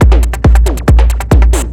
drm 138, Techno, drum-loops; rhythm

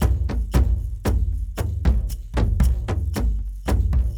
Kendong, World Percussion; Brekete, Shaker

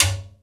Timbale

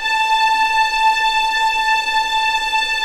A5 LEG P, VIOLINS; East West; The Ultimate String Collection

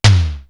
electro tom; East West; Global Sounds

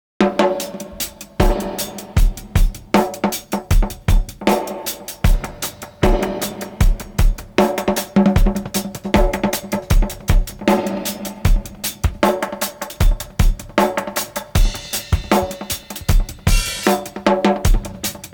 Dub All Bubble 77; AMG - Ruff Cutz

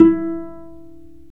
STR VC PIZ, Chamber Pizz, String Master Series

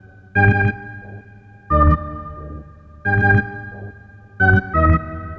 Organ; Sonic Foundry (Sony Creative Software); Stylus Pressure Urban Grooves on Digital Wax; Smooth Jam

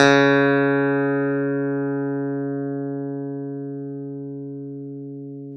CLAV; Clav DA; Roland LCDP10 Keys of the 60s and 70s 2

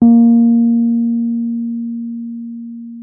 WURLITZER A#3; Xsample VOL-1; WURLITZER RS

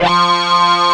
AN SYN, SYN & LEAD; SAMPLE WAVE MIXING 2

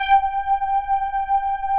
SYNTH SYMPH; Pro-Rec - Synthsonic; Z1 PADS

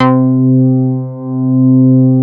MOOG BASS C4; Best Service Dream Experience

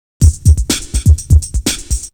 Beat; AMG

